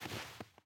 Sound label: snow step